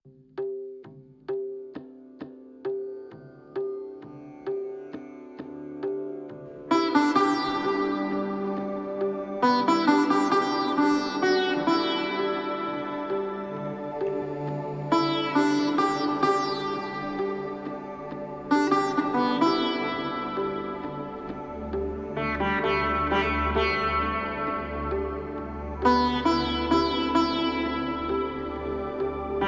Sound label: Sitar Melodies for Massage